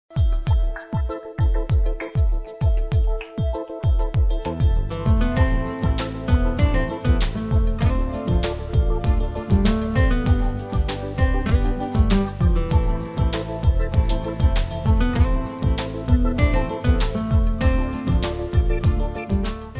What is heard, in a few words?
New Jazz; on hold message music